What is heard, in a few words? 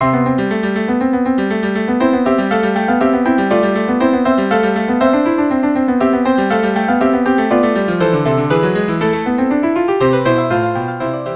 quartet; Dance of Jesters Rims Kors